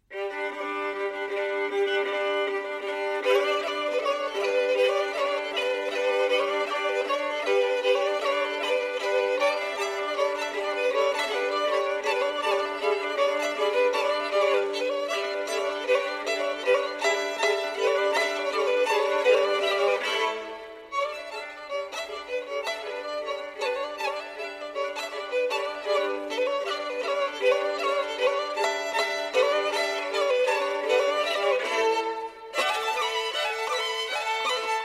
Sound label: Duos for 2 Violins, Bagpipes; 6 Duos for 2 Violins; Yehudi Menuhin